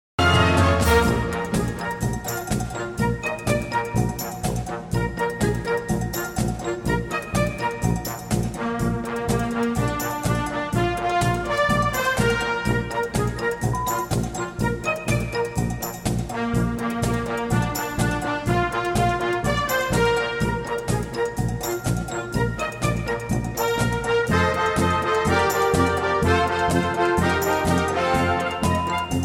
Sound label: Marching Band